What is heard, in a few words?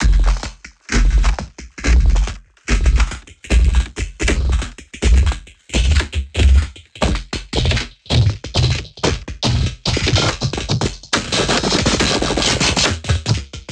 Loop, 70 bpm, Circuitbend